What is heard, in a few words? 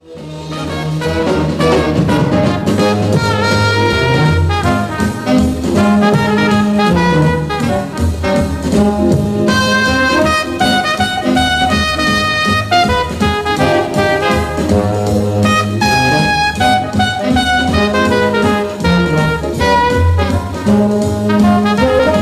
trumpet solo only